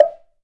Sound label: Block f; Digital Sound Factory